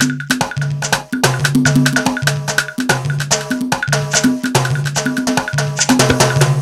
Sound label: Congas Timbal, Percussion Loops